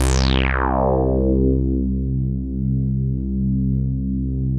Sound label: VV SWEEPC4; Masterbits; Vintage Voltage